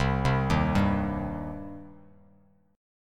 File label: C Minor C down Bass Standard; arpeggiated; JGuitar